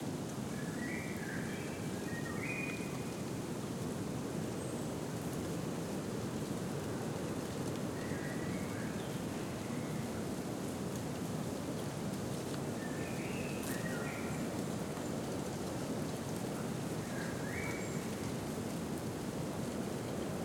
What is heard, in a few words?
Forest Spring Noon Deciduous Forest Gentle Wind Leaves Rustling Blackbird Raven in Backg; Forest Ambiences; Blackbird Raven in Background; Justsoundeffects